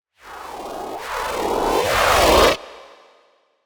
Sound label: wave swell